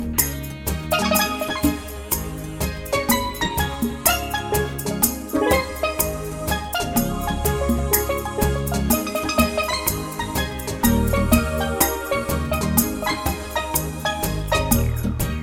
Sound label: Guitar Reggae